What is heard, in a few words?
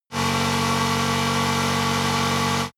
motorcycle engine high constant rpm ascending; motorraeder; verkehr transport